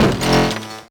CRASHED, Anime Sound FX; COMIC

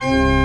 CHRCH, CHURCH ORGAN; Roland - LA Composer vol. 1

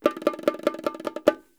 144, Percussion works, LOOPS; Sampleheads; NYC Percussionworks; 90 s Sample CDs

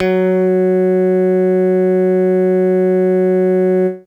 f#3, FAIRLIGHT Electric Bass; Retro Sampler

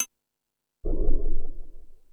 Tool Kit, Drum Machines

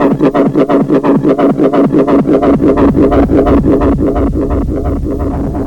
Lo Tek Impact, Weird; Industrial

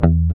GUI MUTE, E-Gui Muted

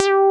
303 bass; Pro-Rec - Synthsonic; BASSES

